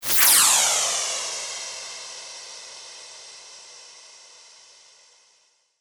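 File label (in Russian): лазерный гул мигает через кино и телевизионные звуковые эффекты перехода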